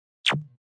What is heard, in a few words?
Zap; Zaps; Electronic